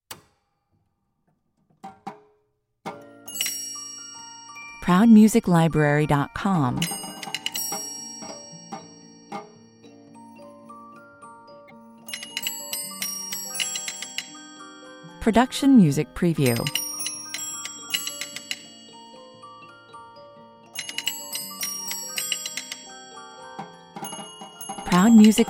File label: novelty music box theme